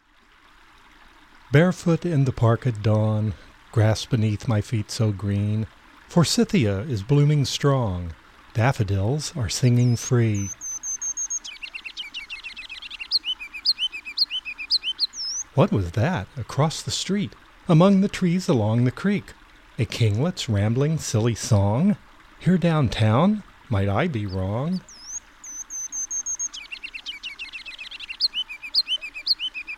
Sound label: kinglet